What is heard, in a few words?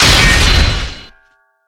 impact heavy